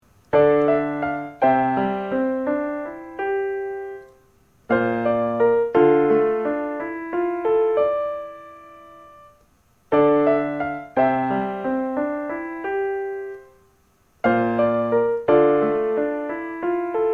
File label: piano bgm